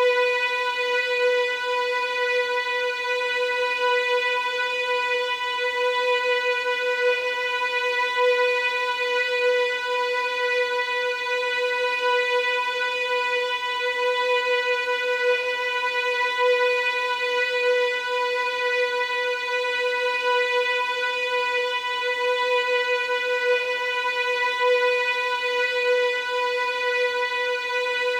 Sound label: cave suspense, music ambience; The Swapper